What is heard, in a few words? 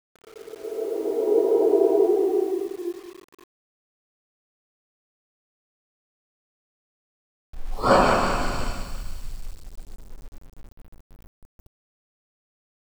angry phantom